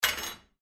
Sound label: Dishwasher Place Spoon In Rack; Household; Whirlpool Appliance